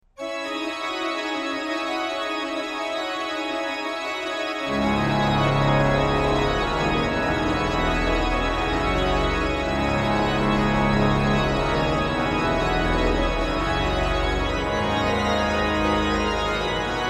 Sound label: Toccata, ORGUE; Omega